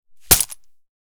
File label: Coin Purse; game inn